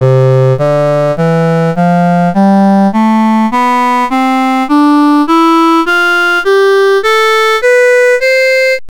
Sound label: viol